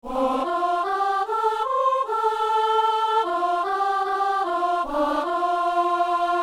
a tes enfants seigneur accorde ton amour, soprano

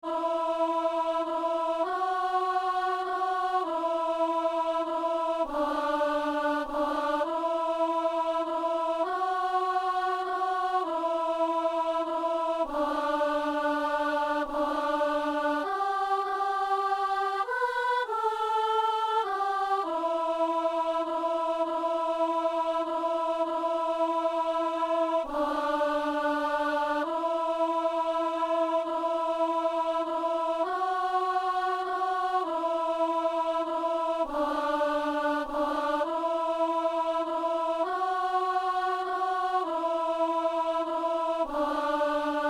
une voix parcourt la terre, alto

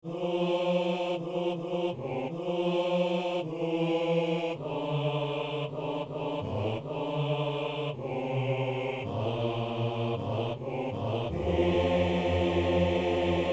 basse

